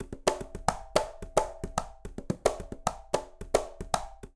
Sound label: Guaguanco 1 Quinto 220bpm; Latin Percussion; Ableton; Factory Packs